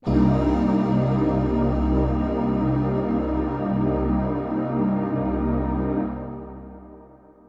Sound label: SYNTH PAD AMBIENT 160 C, Ambient; Sphere